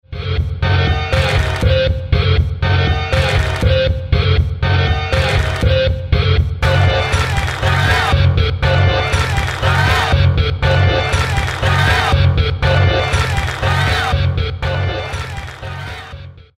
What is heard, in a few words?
beats